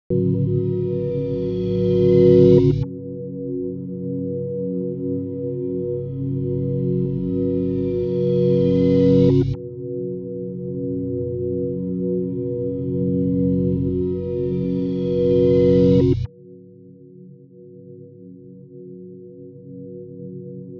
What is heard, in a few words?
Radio; Milk outside a bag of milk outside a bag of milk